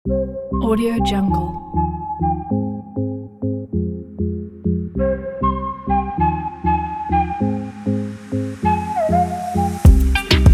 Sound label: dance pop